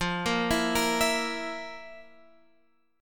F Major 7th Suspended 4th, Guitar, Open F; FM7sus4; x,12,10,11,13,11; arpeggiated

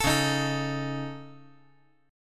C sharp Suspended 2nd Sharp 5th C sharp, chord; C#sus2#5; strummed; JGuitar

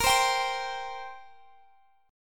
G Minor Double Flat 5th A sharp chord

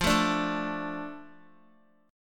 F 6th F down Guitar; F6 strummed

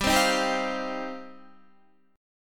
G 7th Suspended 4th Sharp 5th, Guitar

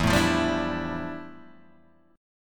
F Major 13th E, guitar chord

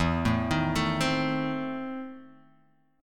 E Major 7th Suspended 4th Sharp 5th; EM7sus4#5 arpeggiated

